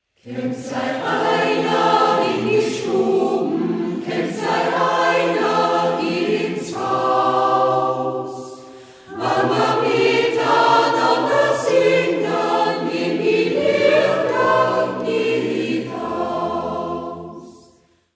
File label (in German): Chormusik